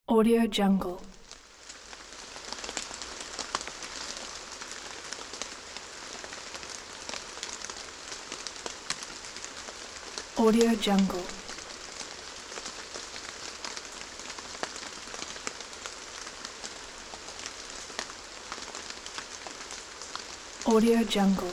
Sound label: light rain on grass